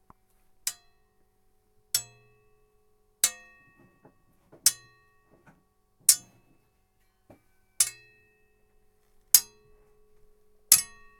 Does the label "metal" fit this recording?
Yes